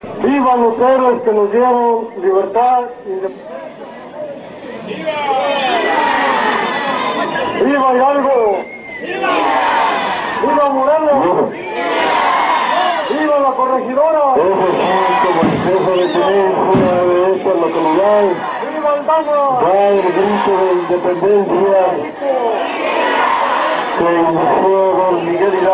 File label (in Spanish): grito